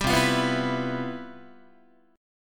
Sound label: C Major 11th C, Guitar 4A,4E,5A,5D,5G sharp,6C sharp; CM11 strummed